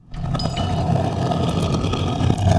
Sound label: STONE START